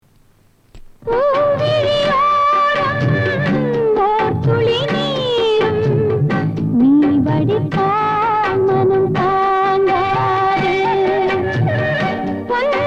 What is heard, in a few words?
Female